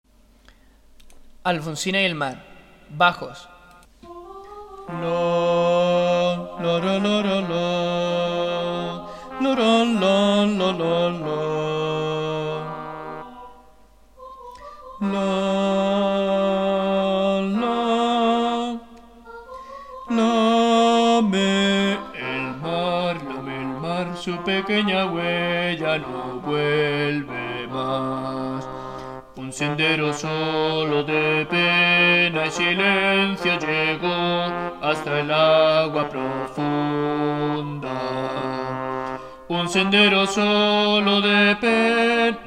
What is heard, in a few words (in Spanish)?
bajos